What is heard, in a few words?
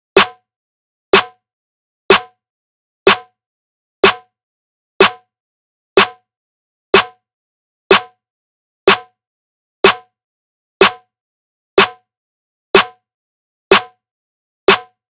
Drum Loop, 124 BPM Snare; Loop Stems; Cymatics; Anubis Drum Loop 2